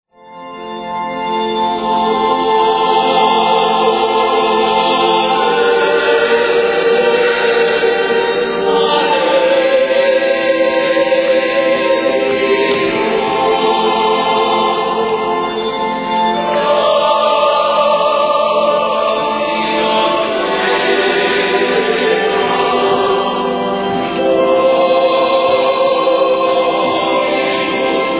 ave maria gounod sexteto; Ave María – Gounod3

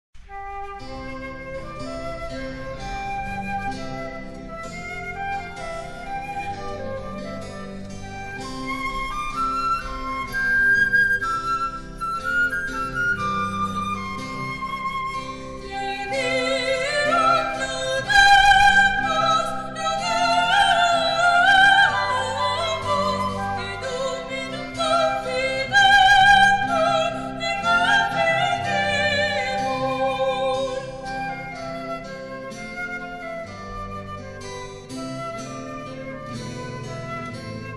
te deum flauta; Te Deum (Charpentier)

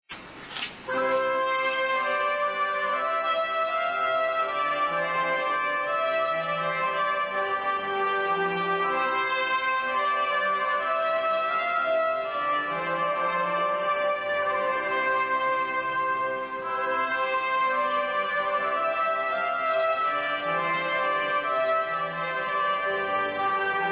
trompetas voluntarias; Trompetas Voluntarias - Clarke

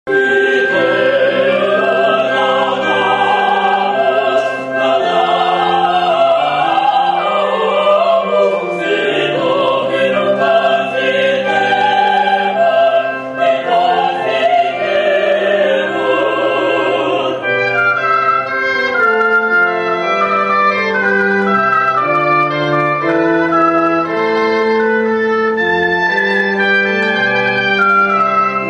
te deum charpentier sexteto; La Agrupación Laudate Dominum

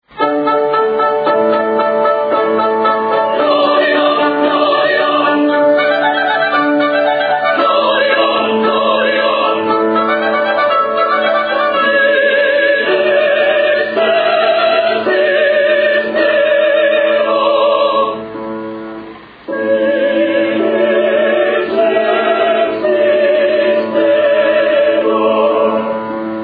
gloria sexteto; Gloria – Vivaldi1

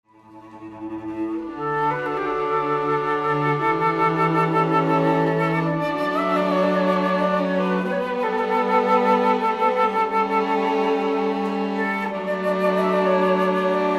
oboe fl cuerdas

